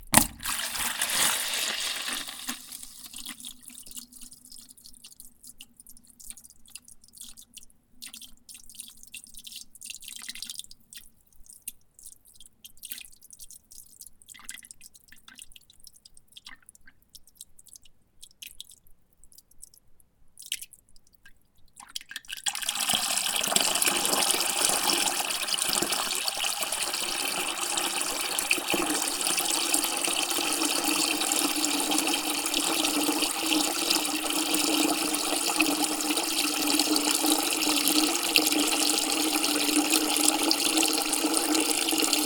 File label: water from basin to bucket